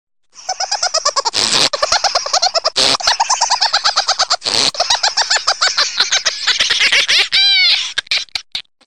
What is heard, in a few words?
funny laugh ringtone; funny ringtones